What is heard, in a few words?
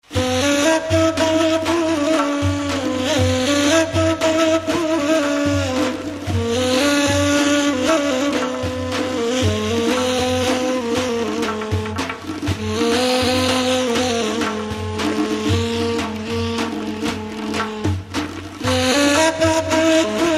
Ney